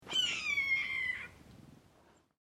Red Tail Hawk Single Call, Bird of pray, hawk, chirping, calling; Swamp, Marsh & Woods; Bird Sound Effects; LYN108 package of Swamp, Marsh & Woods